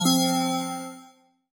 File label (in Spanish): campana; Steven Universe - Beach City Drifters